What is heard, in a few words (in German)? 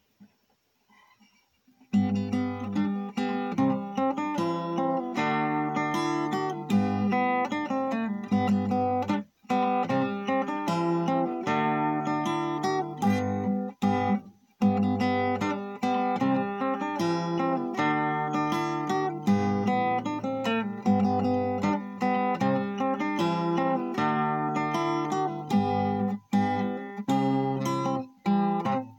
Landler in G Dur